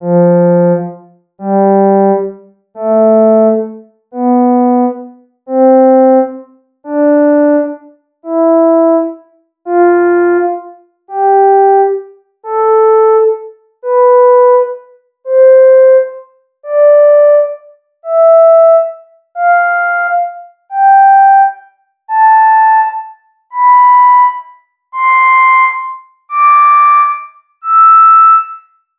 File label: frenchhorn